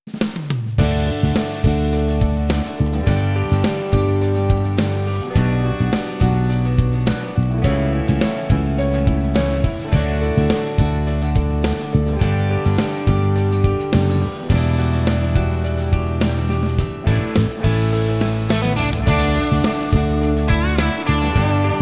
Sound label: Production music Guitar Pop samples live